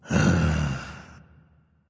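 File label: nonverbal content; smite; voicepack; ullr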